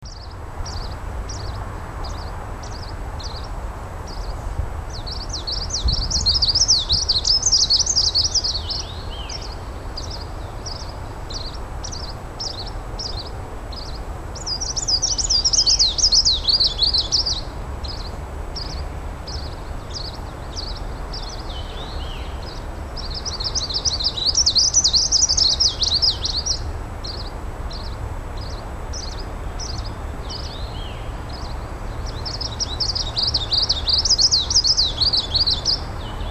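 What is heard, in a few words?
Ph trochiloides song background C erythrinus song; Пение зеленой пеночки; Пение двух самцов зеленой пеночки; Иркутск; Иркутск, 31 июля 2011 г.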